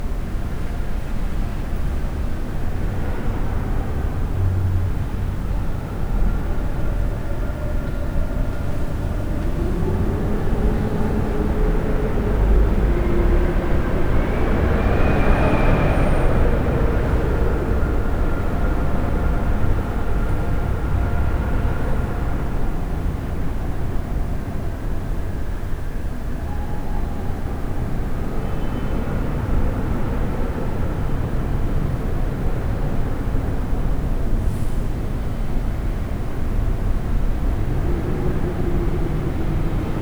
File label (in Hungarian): felbugo suvito szel neszekes jarmuforgalom katedralis